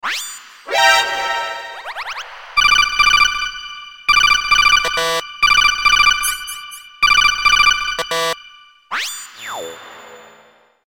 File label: codec; ringtones; mgs